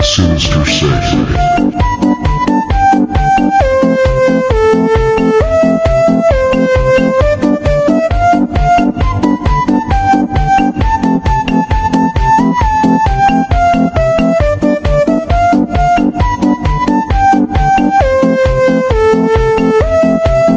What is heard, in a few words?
Funky Russian Train